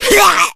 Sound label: smoker pain short, voice; Left 4 Dead 2